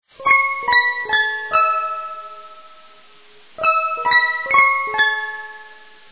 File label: bigben; Reuge